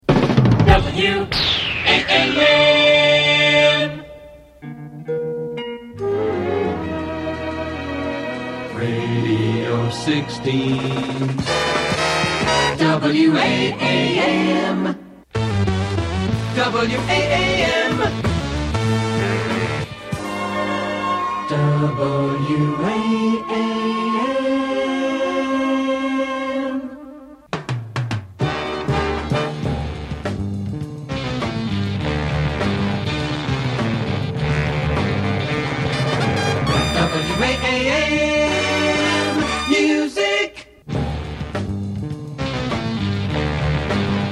Radio Jingles; WAAM AM Radio

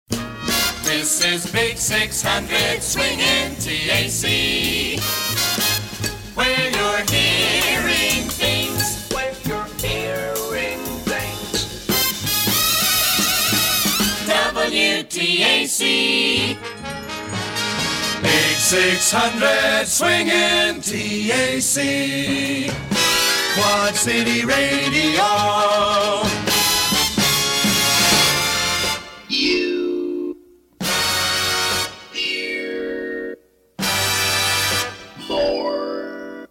Jingles Montage; WTAC-AM; 600 AM; Los Angeles

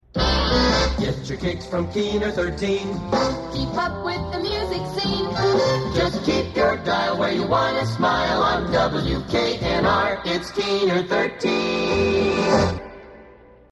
Jingle; WKNR-AM; Get Your Kicks Keener 13; Richard H. Ullman; Los Angeles

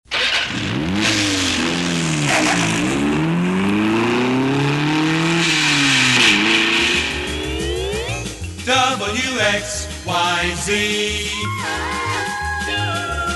Racing Car

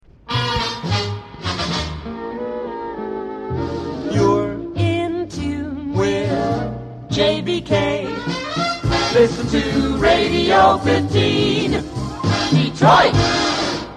Jingle; WJBK-AM; Radio 15; You're In Tune With WJBK

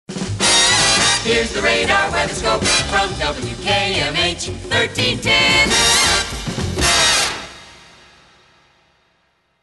Radio Jingles; WKMH

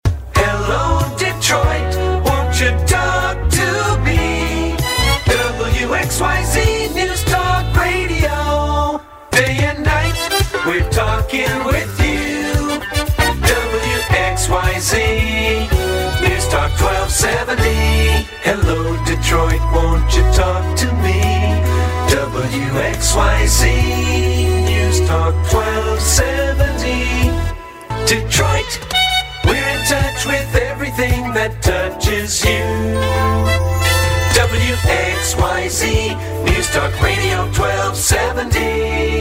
Jingles Sampler; WXYZ-AM; JAM Productions

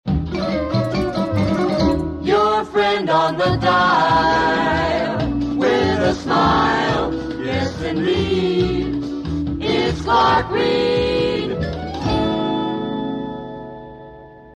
Jingle; WJBK AM

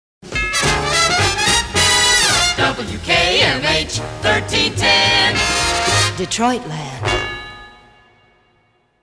Radio Jingles; WKMH

